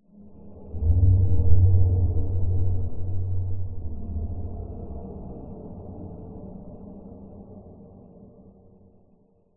cinematic dark short horror drone atmos

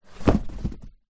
sport exercise mat foam thin rolled up set down

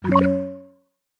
game tone soft synth slightly dull generic notify

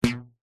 foley rubber band ping med pitch

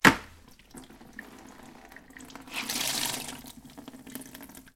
household mop rinse in bucket

